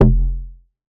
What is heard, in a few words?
Bass F, Bass Synth